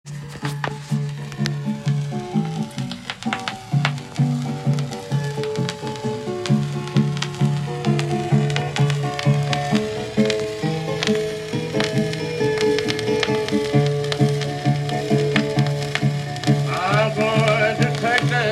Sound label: Cottonfield Blues